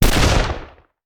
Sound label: Rifle; Sound Ideas; Guns